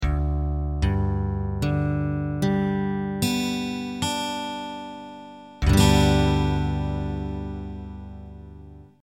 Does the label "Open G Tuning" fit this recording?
Yes